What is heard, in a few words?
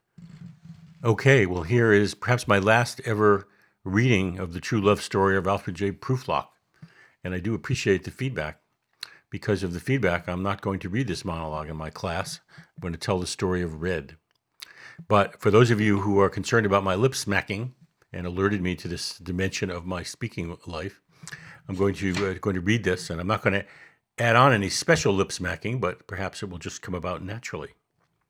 Lip Smacking